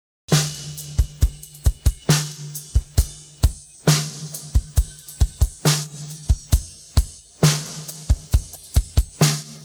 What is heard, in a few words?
pattern, drums